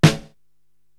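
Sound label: snare drum Sleepy, Drum Machines, Korg TR-Rack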